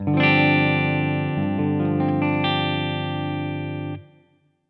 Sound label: G7sus4, chords pop